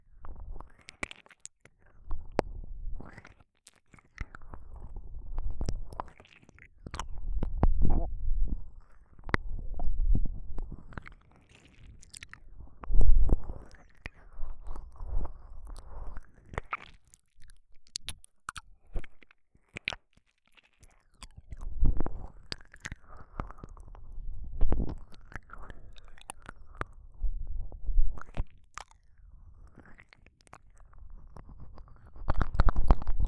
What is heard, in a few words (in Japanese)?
徐々に深くなる耳舐め左